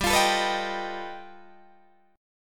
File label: G Augmented 9th, Guitar, Open D